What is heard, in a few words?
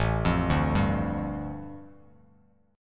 A 7th Suspended 4th Sharp 5th, A, down, Bass Standard; A7sus4#5 arpeggiated